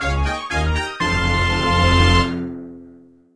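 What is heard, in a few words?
jingle; SMG2; GREENSTAR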